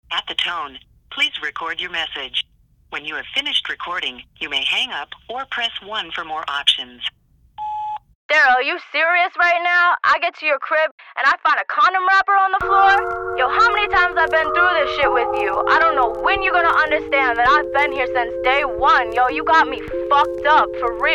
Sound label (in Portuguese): quadrilha rap